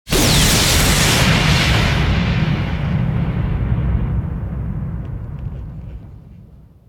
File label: Lightning Strike Impact; Evolve; Kraken